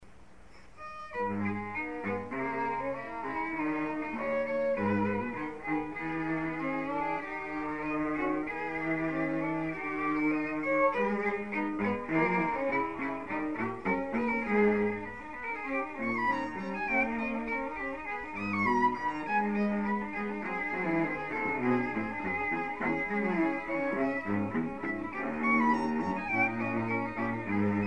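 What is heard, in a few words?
string trio; hopfe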